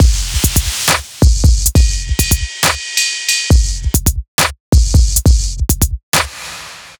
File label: Bpm137 Break, Dubstep, Drum Loops; Gravity